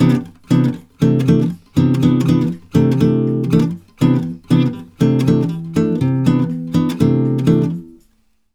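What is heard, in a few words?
120 guitar C, brazil bossa; USB Soundscan; AKAI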